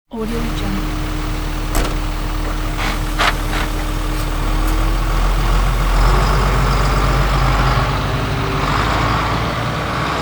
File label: truck reverse drive away; fxprosound